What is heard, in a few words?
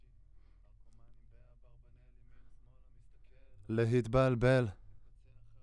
Voc OD